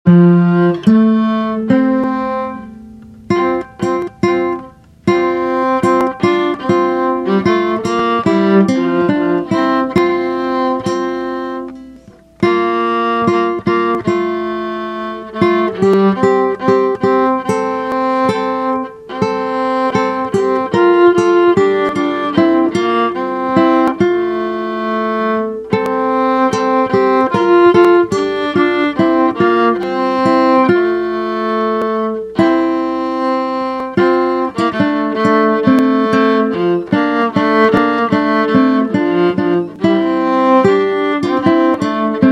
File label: A bow S guitar; Quando ritrovo